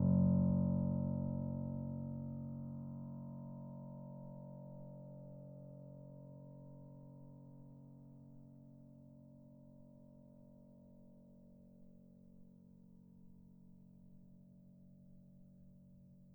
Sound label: F#1, bitKlavier Grand Binaural